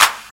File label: clap d key, Royality free clap tuned to the D note. Loudest frequency: 3393Hz; percussion; single hits one shots